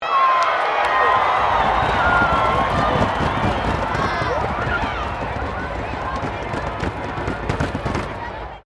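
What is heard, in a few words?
crowd 15K Rugby World Cup cheer medium, Rugby world cup game; Crowd Sound Effects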